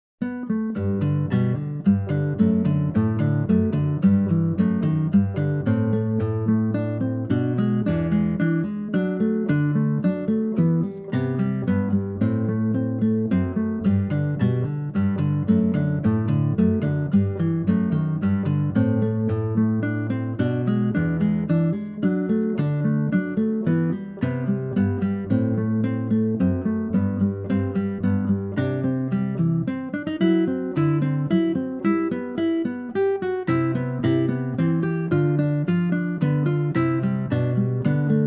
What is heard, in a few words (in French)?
valse; captivante